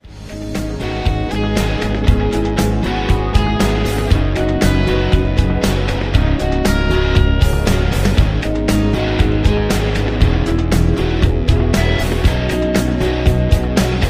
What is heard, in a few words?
rhythm